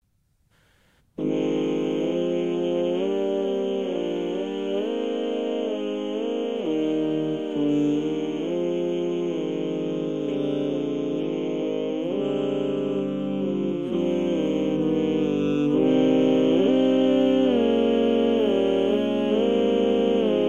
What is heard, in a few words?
Bhumming; 全体